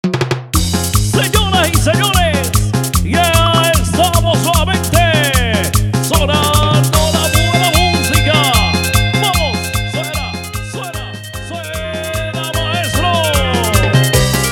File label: Instrumental Chicha Duo Requinto, BPM 150